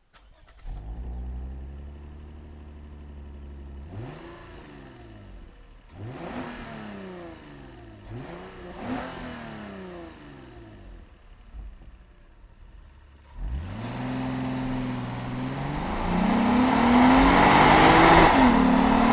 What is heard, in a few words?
Lexus IS250 AWD F sport